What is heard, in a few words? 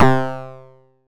Hit, Stab & Hit; Bitkits